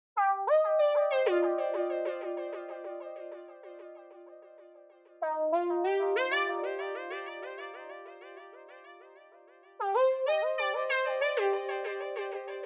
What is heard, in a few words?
Sirens